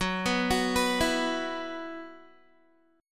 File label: Gb 7th Suspended 4th Gb down Guitar, chord